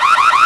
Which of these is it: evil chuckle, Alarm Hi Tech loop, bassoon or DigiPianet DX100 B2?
Alarm Hi Tech loop